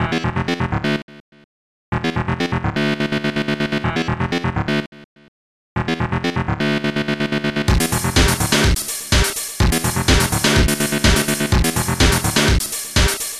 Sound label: Ghost Hardcore; Euroscene 2; TWILIGHT